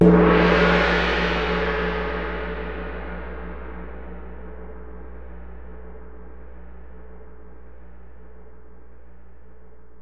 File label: Ride, Alesis DM-PRO